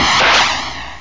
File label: rocket